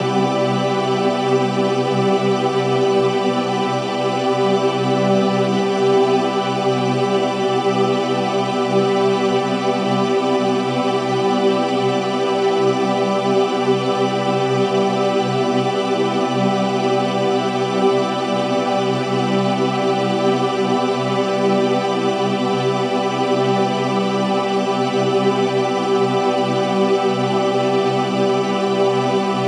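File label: pad loop holy 150 C major; Virtual Riot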